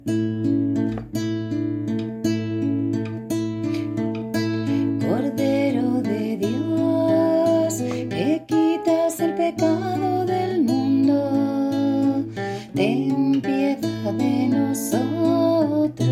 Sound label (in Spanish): CORDERO DE DIOS TRADICIONAL; CUARESMA 2020